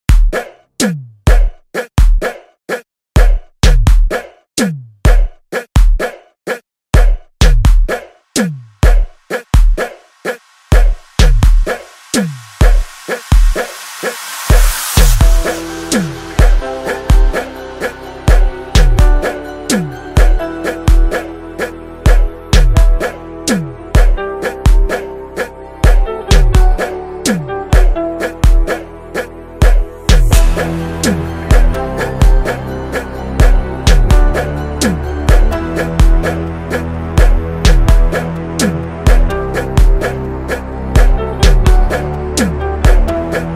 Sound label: Trumpet Remix